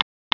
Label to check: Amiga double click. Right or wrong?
Right